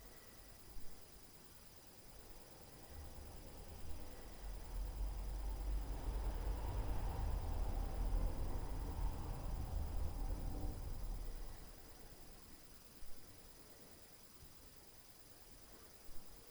STREET CAR NOISE

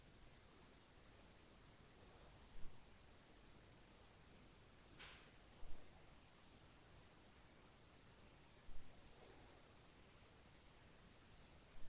TAK NOISE In Main Room, Night Noise

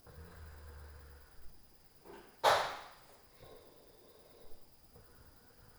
Sleep + SUPER TAK NOISE, Night Noise

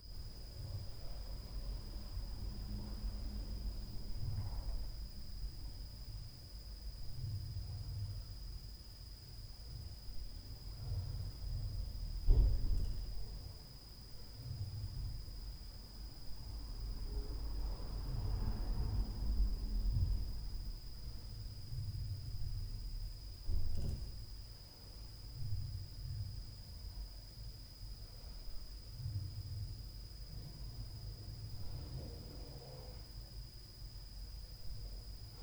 STAIRS + DOOR NOISE, Night Noise

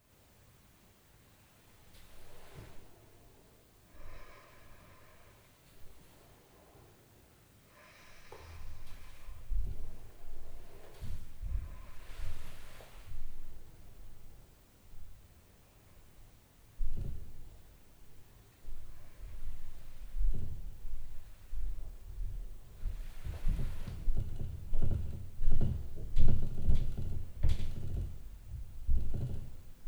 Sleep + LF UPSTAIR NOISE, Night Noise

